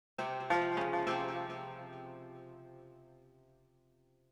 Strings; Cairo

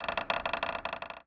Windmill Creak

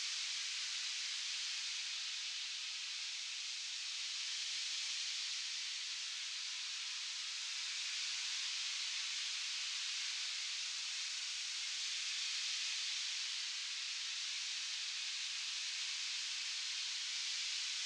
Amb Loop; Sx Maze Sketch

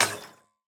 Wire Cut

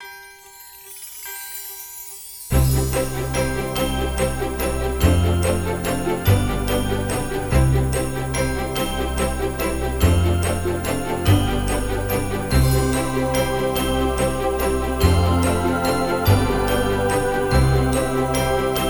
Holidaycheer; Grimlight: A Tale of Dreams

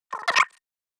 Player Idle

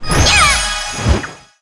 Medica F skill offense

